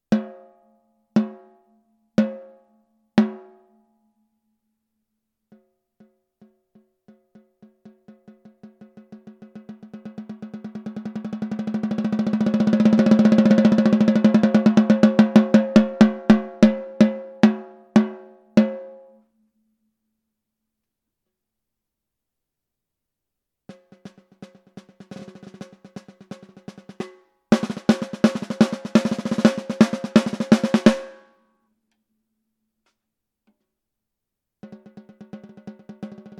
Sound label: symphonic; PEARL